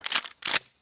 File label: movie shotguns